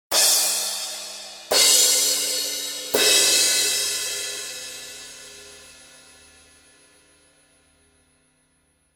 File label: Paiste Crashes